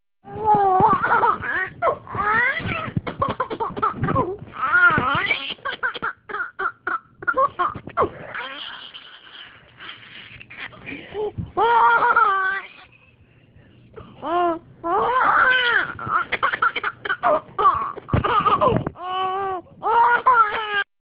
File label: laughing